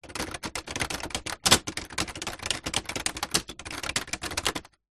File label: electronic typewriter power off typing fast manic keyboard buttons; office; brother ax 450